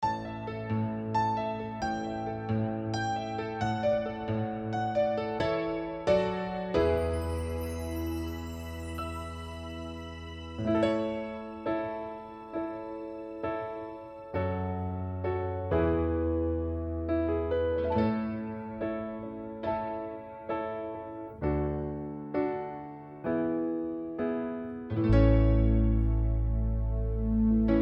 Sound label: Song Act no vocals